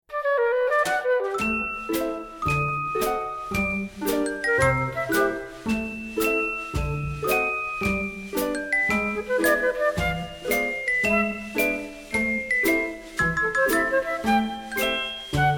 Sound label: Instrumental; Audition Cut